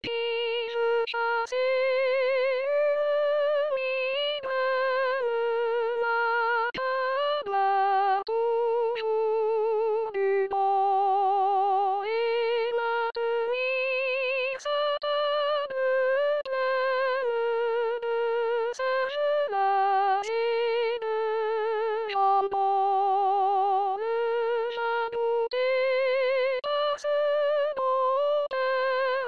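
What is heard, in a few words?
soprano; Bataille; chanson a boire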